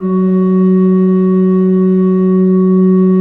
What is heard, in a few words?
RFRP F2, Cathedral Organ, ROHRFLOETE; Propeller Island